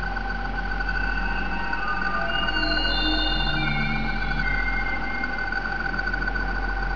weird; quake2; serious

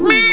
fall; quake2; penguin

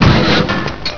fall; quake2; dalekx

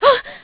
jump; quake2

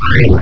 pain; quake2; ontra

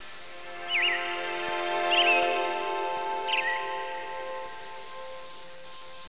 death; quake2; prabbit

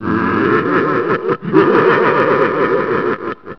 ghostly; quake2; kitsune

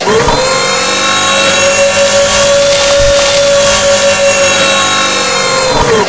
press1; quake2; fox

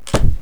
land; quake2

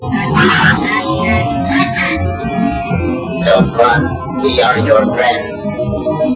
pain; quake2; martian

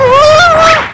drown; quake2; hottie

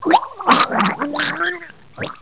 drown; quake2; barny

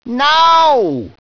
death; quake2; hd

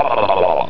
drown; quake2; postal

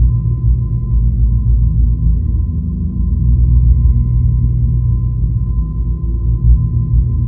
tunnel drone; quake2; chaos

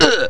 bump; quake2; postal

